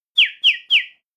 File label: piyopiyo; 口笛; 映画やドラマなどで良く使われる口笛の定番音源